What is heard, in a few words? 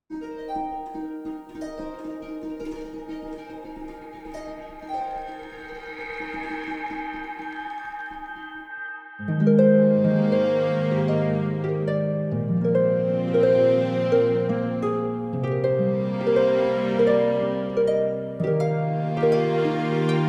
naviaharp Promo Music